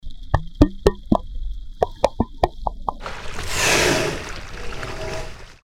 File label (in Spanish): ballena